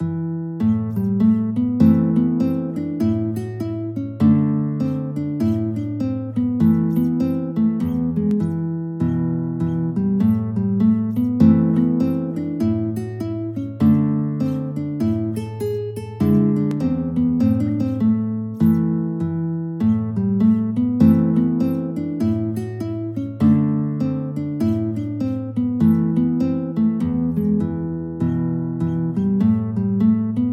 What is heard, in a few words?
Fingerstyle guitar; Gilderoy